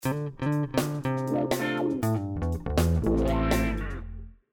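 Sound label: tower up building fail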